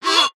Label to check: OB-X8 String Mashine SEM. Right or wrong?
Wrong